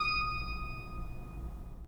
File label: 3D Organs Pipe Mix Celeste; E-MU Producer Series Vol. 5 – 3-D Audio Collection